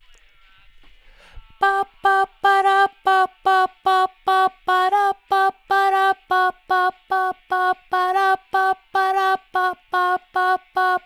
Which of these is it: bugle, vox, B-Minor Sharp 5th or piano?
vox